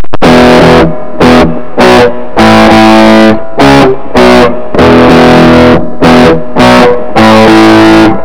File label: electric guitar